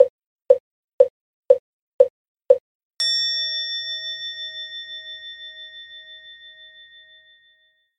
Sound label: mokugyo